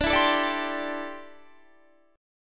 D 7th Suspended 2nd Suspended 4th, D, down, Guitar; strummed; 4E,4A,5D,5G,5C,6F; chord